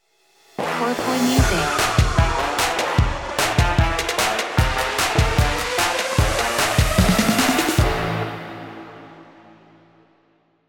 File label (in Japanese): jingle ウォーター